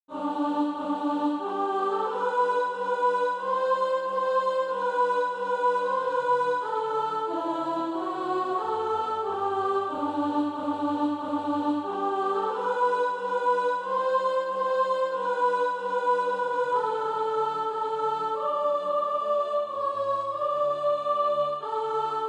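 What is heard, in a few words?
soprano, Practise Music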